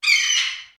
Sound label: bird